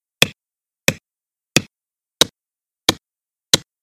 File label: tradeskill lumberjacking